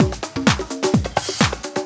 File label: Latin Loop 128 BPM